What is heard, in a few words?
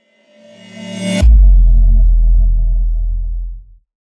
Cinematic Whoosh; 电影感转场2